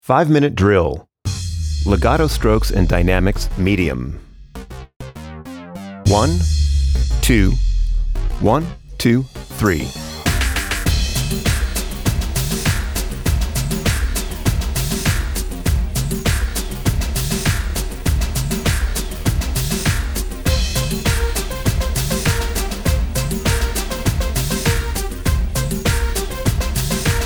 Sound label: pad legato dynamics medium